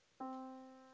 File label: yamaha Disk